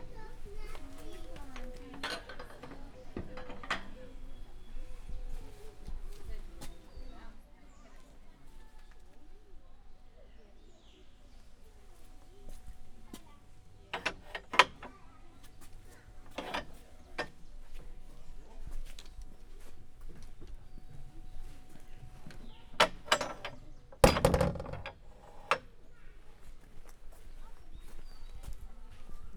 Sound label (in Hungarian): retesszel faajtó bezárása